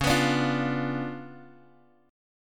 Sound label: C sharp 7th Flat 9th, chord; C#7b9 Chord {X 4 X 4 3 1} strummed